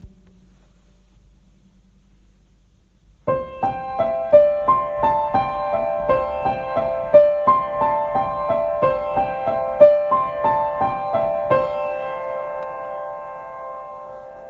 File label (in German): Klangspiel